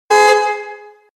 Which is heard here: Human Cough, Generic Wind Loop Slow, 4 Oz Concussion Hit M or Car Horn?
Car Horn